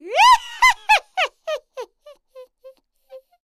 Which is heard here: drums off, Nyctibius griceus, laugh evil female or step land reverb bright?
laugh evil female